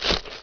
step jungle; SpecOps2 MPlayer Demo

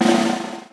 shell drum back; Spearhead Demo; MacFormat 2003 June

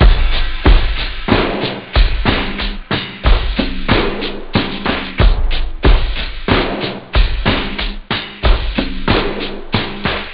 cowbells, house; OctaMED SoundStudio V1

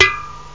snare; OctaMED SoundStudio V1; jazzies samples

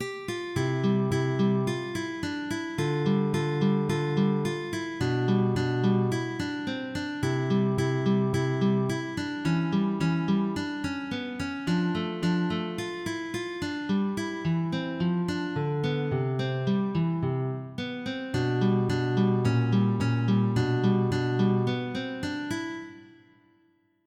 etude carulli; SONGTEXTE VOL. 1

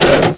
cannon load; Ferazel's Wand Demo

